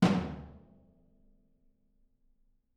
R B Floor Tom B Room; Toms; Sonic Foundry (Sony Creative Software) - Drums from the Big Room The Mixes; R&B One Shots

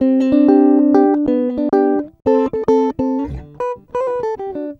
Acoustic Guitar 100 bpm C, African Guitars; Quazibeat; African Secret

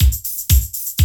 LOOP; Sound & Vision - Gigapack I

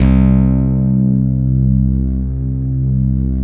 SYN JD8000, Roland; Sound & Vision - Gigapack I CD 2; JD 800/990

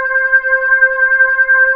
PAD C4, SYN PAD; MKS

